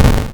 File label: kill; mobs; Learn to Code From Zero With Godot